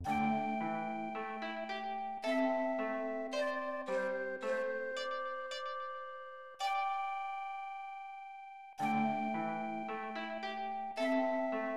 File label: Game End Message; Honkakuha Igo Gosei; SNES